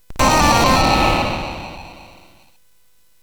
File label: Tecnetium, CSG8580R5; hvsc